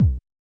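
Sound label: Bass Drum; Yamaha DJX-2B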